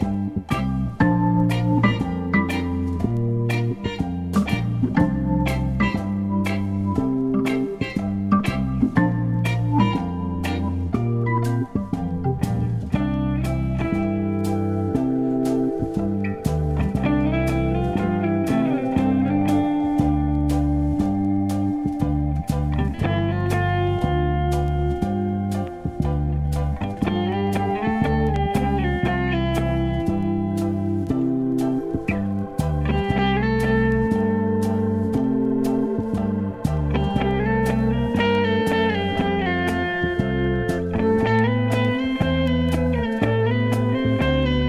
Easy Organ

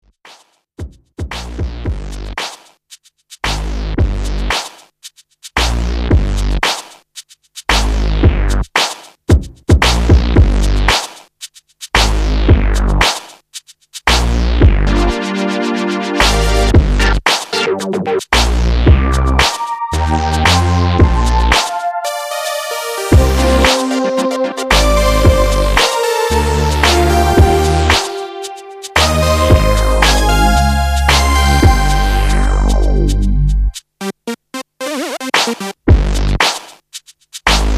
edge off instrumental

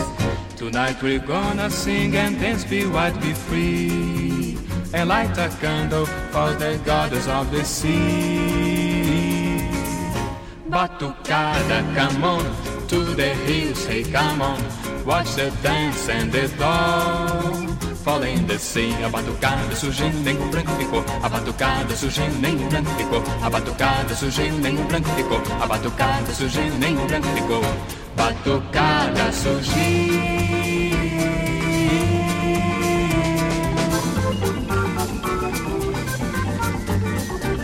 Batucada

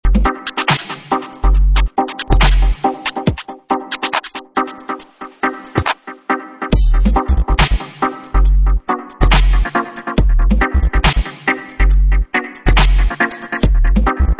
moog dub

